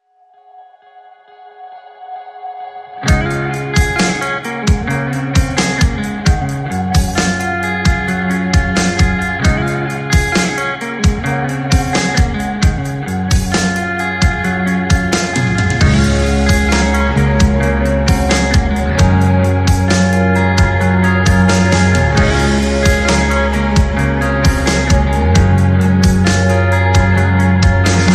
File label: No Lead Guitar